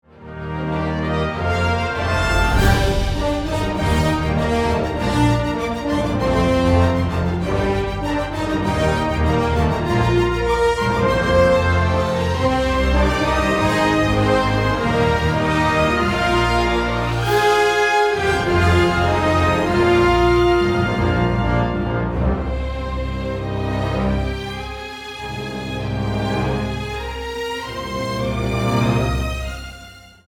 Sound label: hover composition